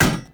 Hammer Impact Metal; Weapon Impacts; Player; Environment; Tuvalkane Hammer

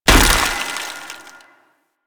Worker Ice Hammer Impact; ambience; props; one offs; Warframe; Venus Open World; Venus Solaris United

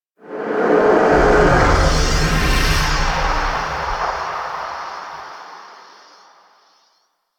Shrine Stinger; Warframe; Revenant

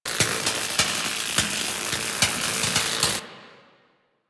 Tesla Coil Loop; Ambience; Warframe; Corpus Gas City; Gameplay Remaster

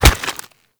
Infested Bone Blade Scatter Shot Proj Impact; Warframe

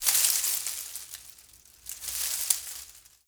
Ext Branch Rustle, Ambience

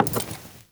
Walk Wood, Footsteps; Characters; Enemy; Lady Deora

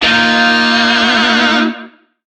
Shawzin Blues Chord; Warframe; Lizzie Shawzin

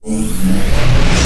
Boss Laser Charge Stereo; Warframe; Weapons; Corpus

